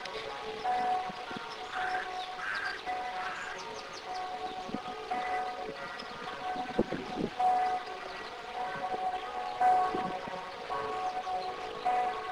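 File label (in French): cloche enterrement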